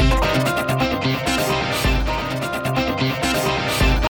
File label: Instrumental